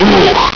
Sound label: "uhh"